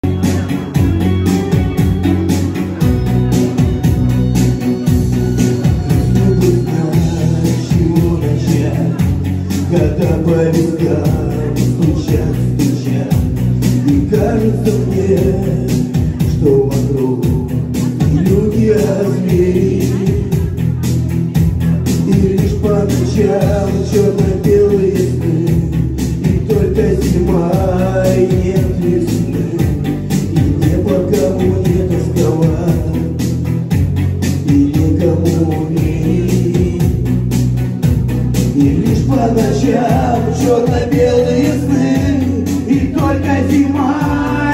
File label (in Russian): russkiy sanson